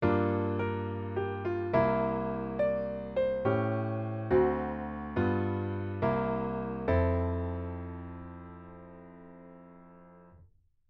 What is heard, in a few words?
7th chords